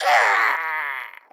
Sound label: Imp Death